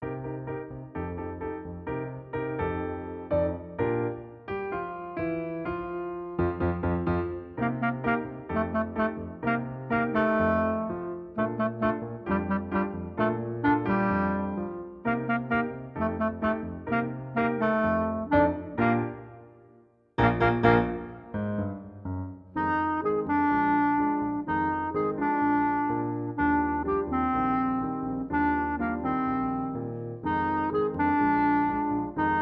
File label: cha cha; from zoo time